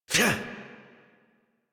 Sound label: Exert, Adult; New War; Melee Swing; Operator